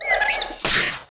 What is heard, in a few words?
Attack; Mob